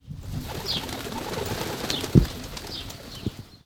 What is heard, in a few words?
Pigeons Starting